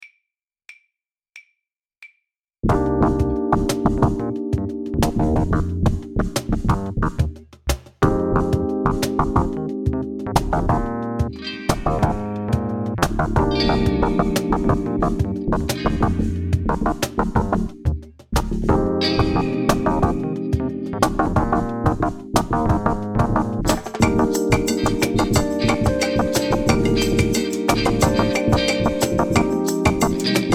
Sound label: slow 90 bpm